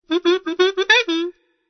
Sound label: SAXOPHONE